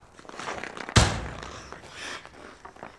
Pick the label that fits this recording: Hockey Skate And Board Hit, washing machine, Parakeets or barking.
Hockey Skate And Board Hit